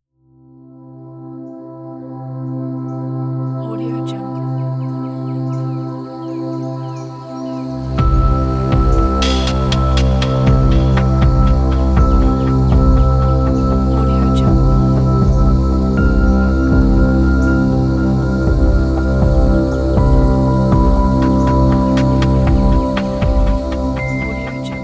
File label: peaceful night